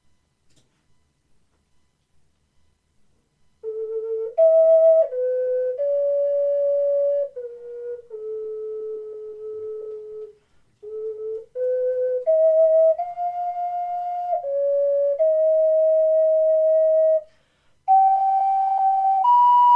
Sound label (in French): melodie de la flute du spectre, ocarina